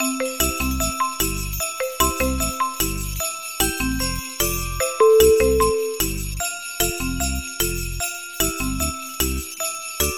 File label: jingle bells reggae